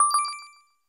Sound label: sparkle